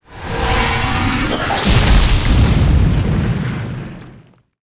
dispel purge

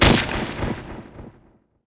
ghost knight die

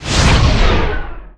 Robo Atk skil; Seer; GamigoNA

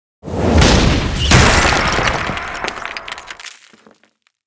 Ground Strike; Seer; GamigoNA

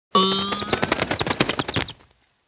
Easter Chicken Action

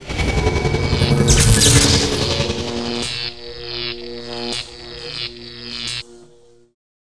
Jewel Power; Seer; GamigoNA

